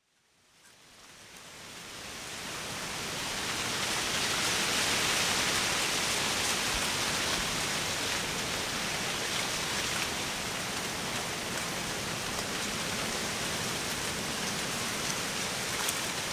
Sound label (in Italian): brezza e foglie; Inquisitor 2